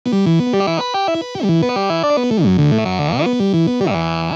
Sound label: Robocoder 110 E; algorave